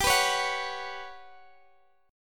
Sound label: G Major 7th Flat 5th, Guitar, Open G; down